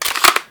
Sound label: magin, thomp; weapons; nzp